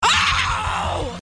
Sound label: ahhowwww